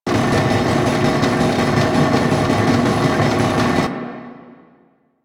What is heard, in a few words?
Fortress Large Door Loop; Ambience; Warframe; Grineer Fortress